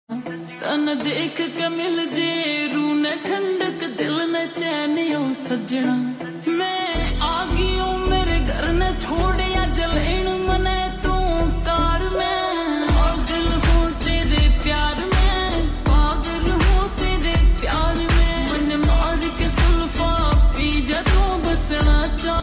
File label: Ringtone Slowed Reverb